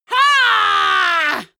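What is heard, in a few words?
female combat attack long, screams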